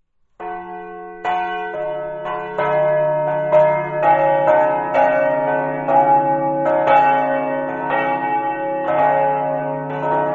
cernetig, campane

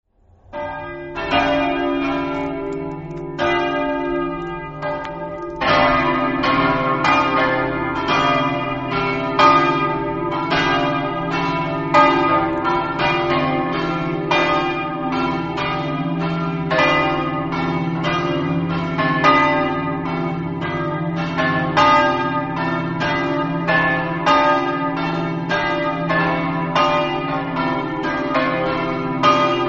montenars, campane

